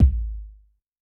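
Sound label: bass drum Dance, Kick, Yamaha DTXpress-II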